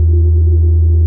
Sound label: Scifi Forcefield Loop; SFX Kit; Sci-Fi Loops